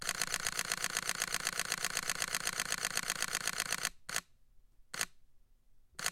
Sony a7R III HI Burst

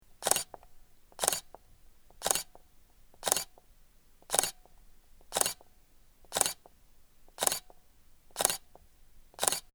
Canon EOS Rebel XSi 450D

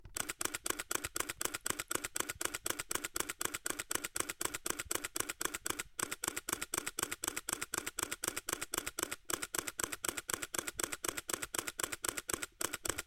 Canon EOS M200 Fast Burst

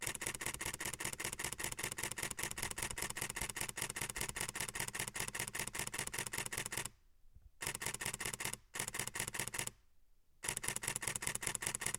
Nikon Z 7 Burst

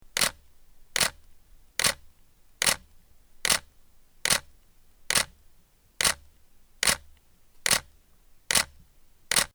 Canon EOS 1D Mark III

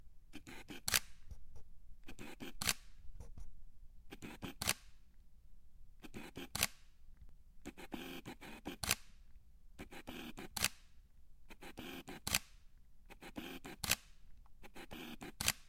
Sony FE 50mm f 1.8 Lens

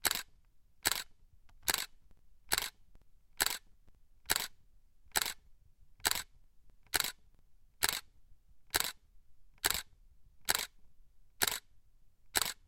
Canon EOS Rebel SL3

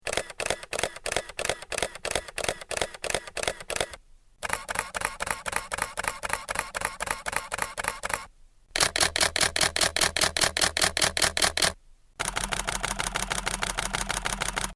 Canon EOS 5D 5DII 1ds III 50D Burst

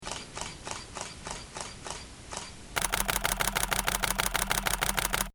Canon EOS Rebel T2i 50D Burst

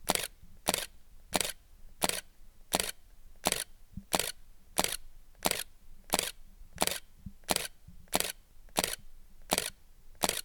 Canon EOS Rebel SL1 100D

